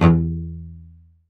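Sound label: E1 SPICCATO, CELLO; Northstar; New Gold VOL-2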